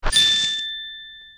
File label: spell unlocked; Politburo